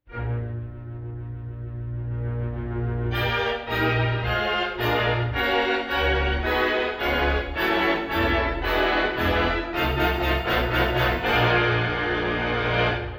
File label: Jingle Bells